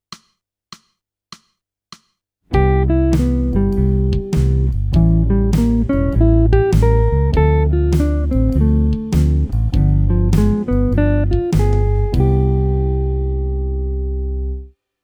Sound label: jazz